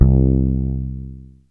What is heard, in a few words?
fretless bass; Jazz